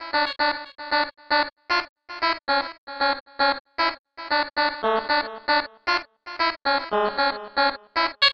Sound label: Guitar Based; Eclectic Electronica